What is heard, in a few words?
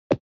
SNES Action Land